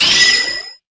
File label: SFX - Powershot Club Effect; Pangya; Fantasy Golf